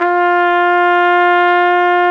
BRS AKG TP, Trumpet, Jaz; Roland L-CDX-03

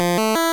Arp, Stab & Hit; Bitkits

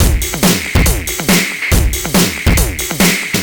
Noise Beat; Drums & Drones

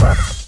SFX HYPER, Processed Kit

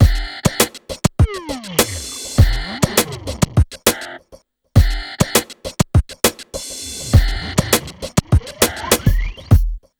MIX, Hip Hop R n B; USB Soundscan vol.19; AKAI

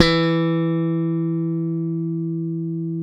POP BASS, Electric Basses; PlatinumPhattCD2; E-MU Producer Series Vol. 8 – Platinum Phatt

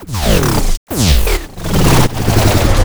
GLID FILL

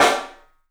PIC SNR, PICOLO ROOM; 3D Sound

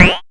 BOSS PERC SNTH, DRUMS PERC

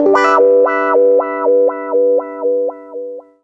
120 WAH PAD, Electric & Acoustic Guitar Loops; USB Soundscan vol.04; AKAI

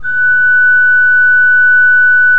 F5, Cathedral Organ; ILIO; Propeller Islands

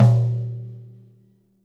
floor tom - snares on; JazzFunkKit

